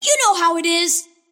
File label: deny pain; kidvoker; dota; invoker